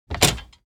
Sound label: Blind lock mechanism unlocking; blinds; gfxsounds